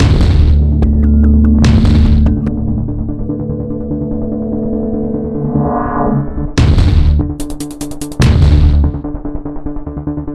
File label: Pause Menu